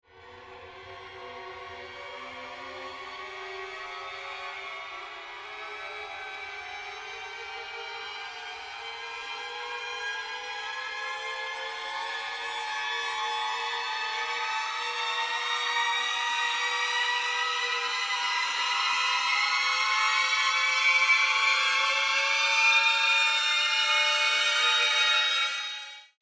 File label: Cartoon horror suspense